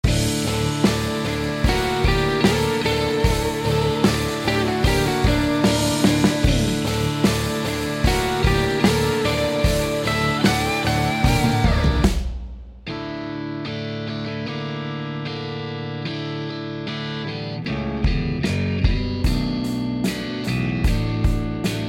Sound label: mix guitar